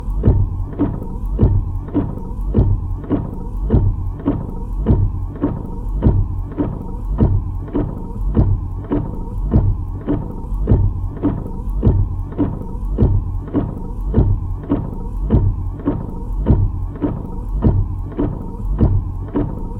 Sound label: windshield wipers, car; ワイパー４